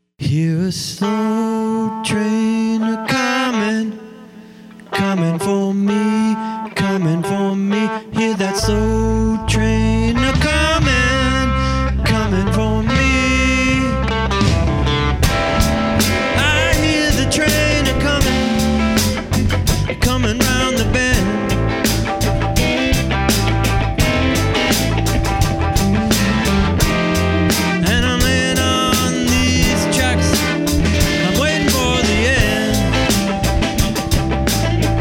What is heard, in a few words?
Slow Train